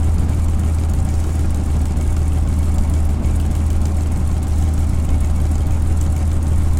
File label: spitfire cockpit, engines; War Thunder